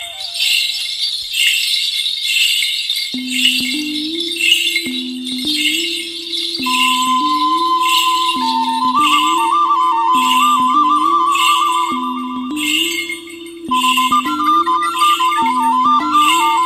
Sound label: Village Tone